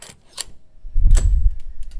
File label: open latch close